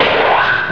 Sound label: Temple Purify; Mythos